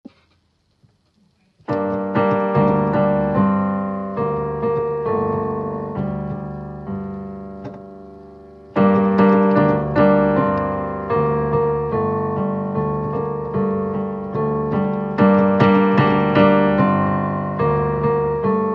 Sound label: Clarinet accompaniment; The Birch Tree